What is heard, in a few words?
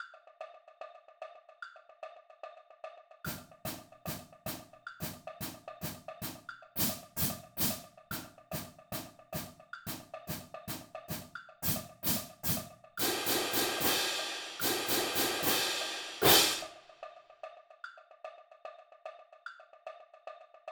Triplet 148 bpm Cymbals; Triplet Dogs